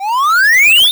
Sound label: Win